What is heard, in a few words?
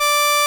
SOLINA, String Machines